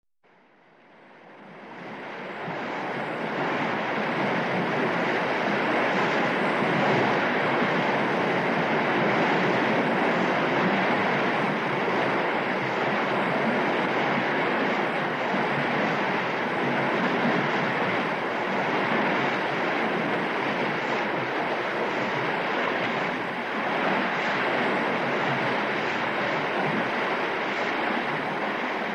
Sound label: Cruise on big ship